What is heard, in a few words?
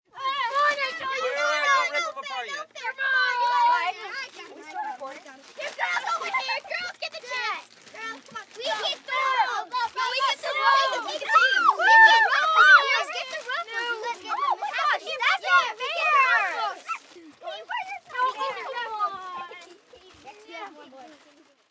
Ambience Outside, Fun, Horsing around, Group, 5-8 children, being silly & fighting; Yelling Sound Effects; being silly & fighting over bags of chips, human voice, kids, boys & girls; VoiceBox; Twistedtracks